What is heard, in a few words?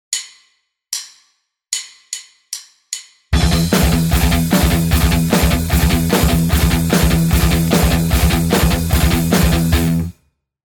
Riff, guitar; Reverse Gallop; Pickipedia: learn to play 23 rhythm guitar riffs